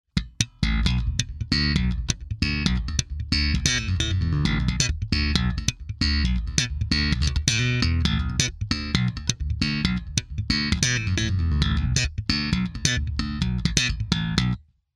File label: Slap compressor